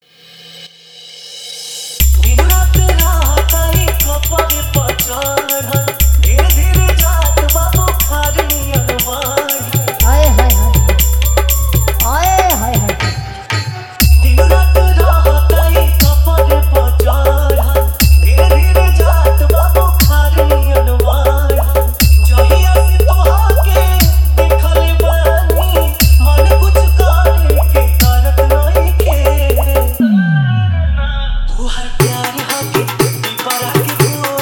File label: Full Dholki Hard; Hard Bass Mix